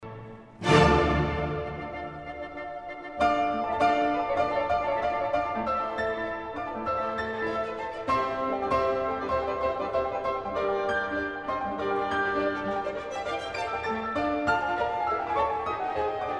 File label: Piano Concerto No. 3 in D minor; Rachmaninov